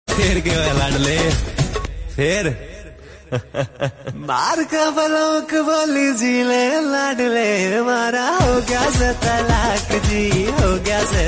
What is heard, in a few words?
Ringtone